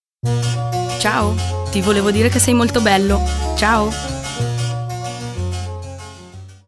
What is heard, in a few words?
Jingle; Sei molto bello